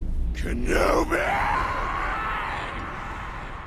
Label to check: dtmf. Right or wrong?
Wrong